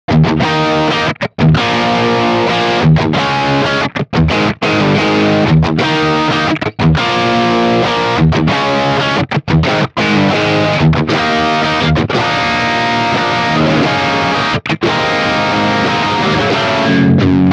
Dirty Plexi

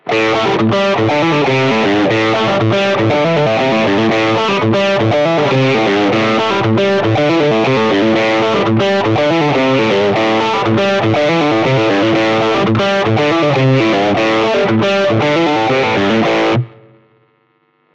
P90 Drive, guitar; Supro

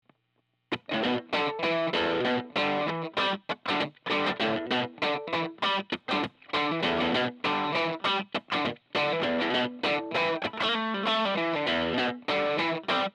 Bridge Overdrive, guitars

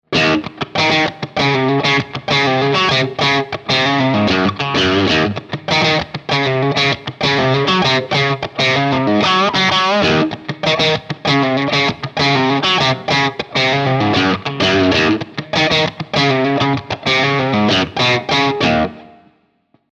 Bridge Spank Drive, vintage guitars; Fullerton

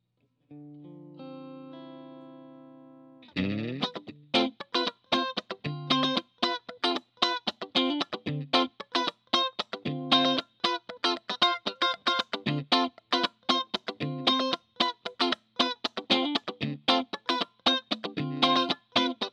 B and M clean

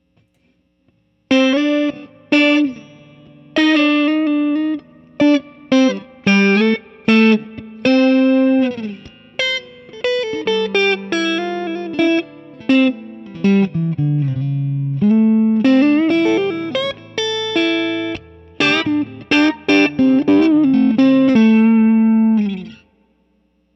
Clean blues, guitars

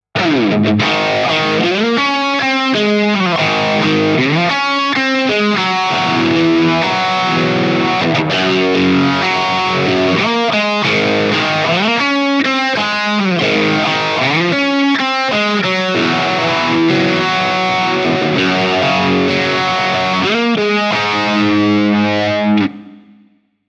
Bridge Dirty, guitar; Fullerton

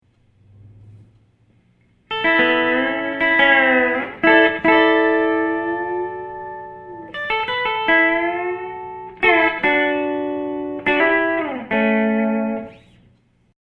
pickup Country steel, guitars; D Leddin

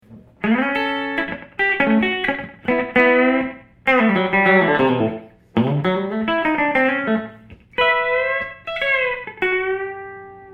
pickup Jazz Country, guitar; D Leddin

